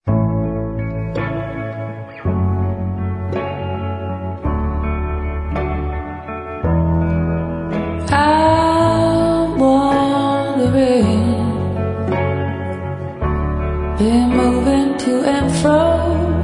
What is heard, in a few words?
Blues Jazz